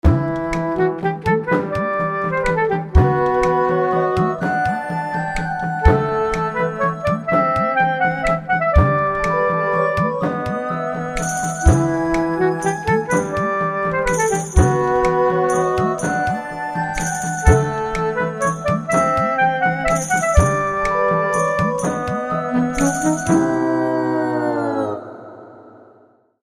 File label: comedy historic drop end